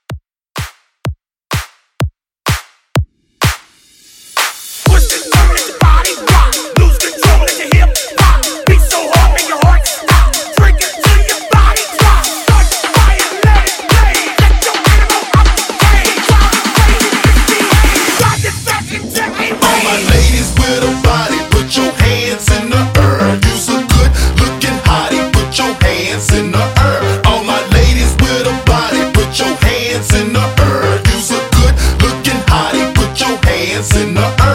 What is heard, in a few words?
Hype 126 CLEAN